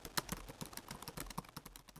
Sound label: Typing